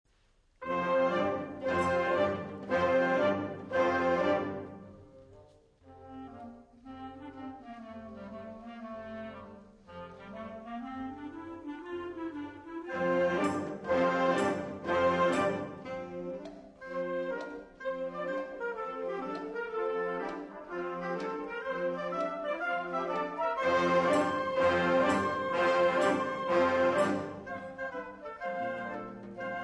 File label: With Fire and Sword, wind band